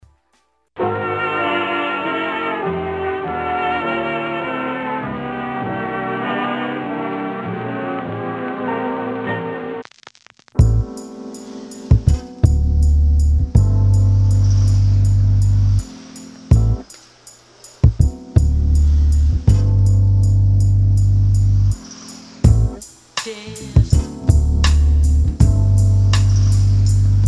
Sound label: Jazz